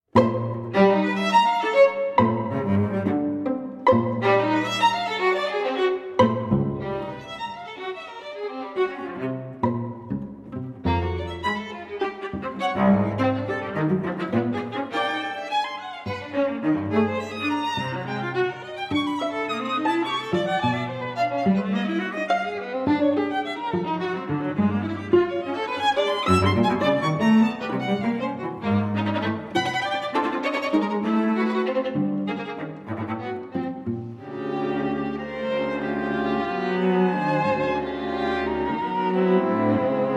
string quartet

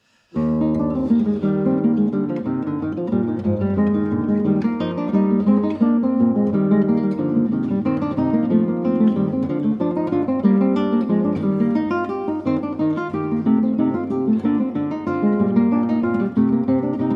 allegro in eflat; Prelude, Fuga, and Allegro in Eflat; BWV998

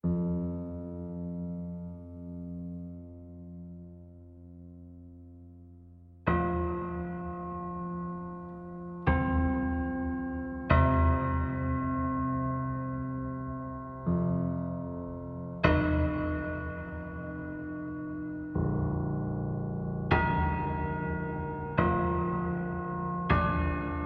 lullaby; plainsound

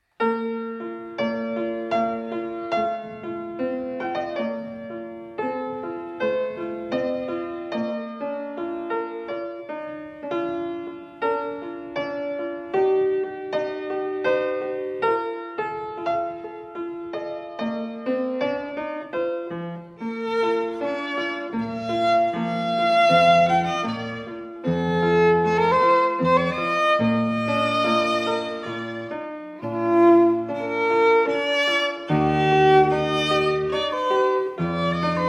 sonata in Bb major tempo di menuetto

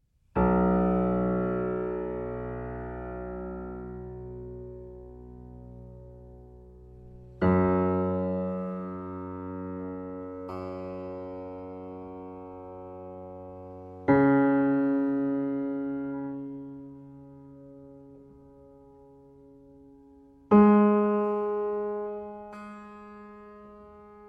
lullaby; Plain Song

